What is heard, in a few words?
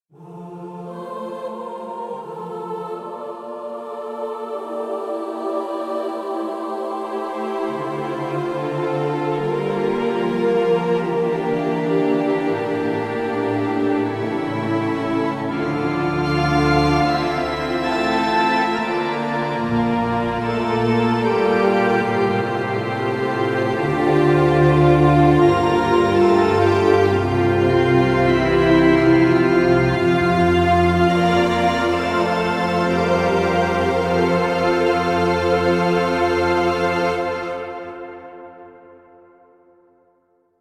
Choir Str Pipe Org